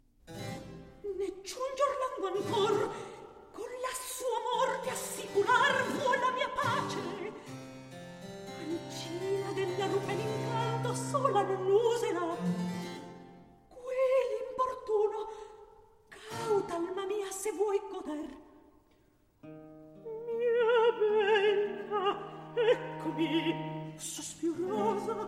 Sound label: Recitativo; Vivaldi; Jean-christophe Spinosi